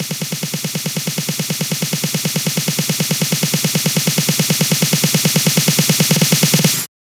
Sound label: 140BPM SNARE; 4Bars; Vengeance Samples; Snares&FX Pairs